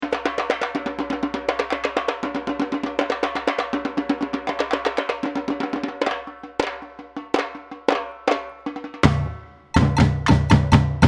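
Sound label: break, samba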